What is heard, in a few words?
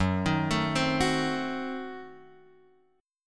F sharp Diminished 7th F sharp Guitar Open F; arpeggiated; JGuitar